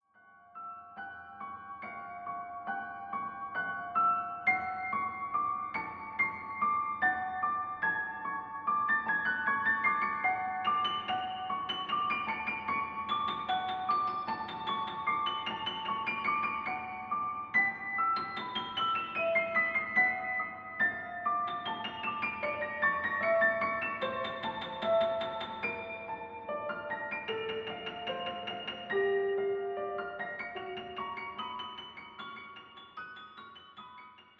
Tanz der Marionetten – Dance of the marionettes Piano